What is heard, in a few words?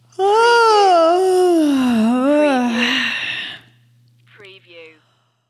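Female Yawn; sleepy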